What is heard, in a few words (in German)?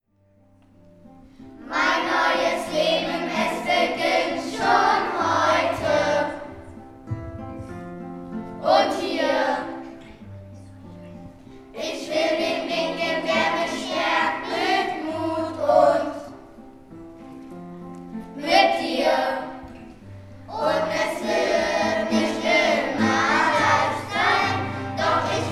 Schulhymne; frankfurt berkersheim